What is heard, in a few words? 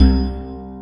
WATER HARP, JV-1080; Syntec; Wall of Sounds VOL-2; ORCHESTR-EXP